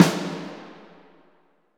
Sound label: SNR SONAR, Orch Snares; Roland LCDP03 Orchestral Perc